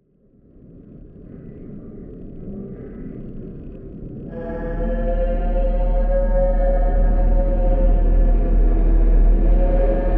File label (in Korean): Tension 공포